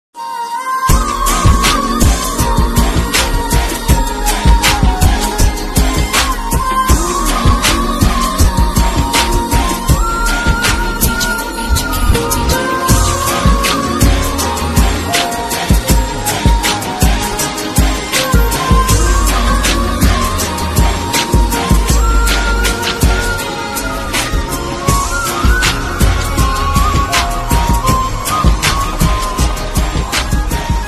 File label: Mobile Ringtone